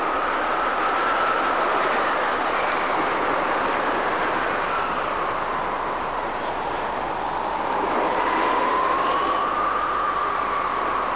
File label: hurricane; Pirates Of The Caribbean